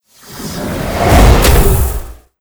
boss demonkin, monster

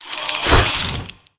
skeleteon attack, monster

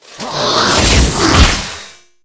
dark phalanx attack

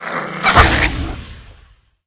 two headed hound attack

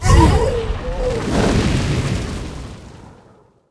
zombie grandma dead

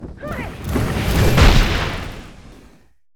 elderdemon atk sp, monster

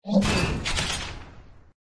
damage, monster

